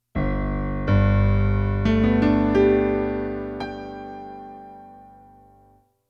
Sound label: piano